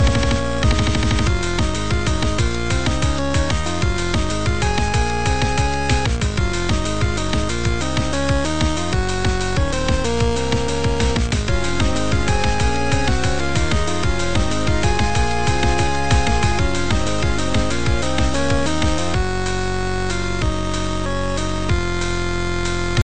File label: 8bit